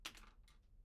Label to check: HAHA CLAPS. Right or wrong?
Wrong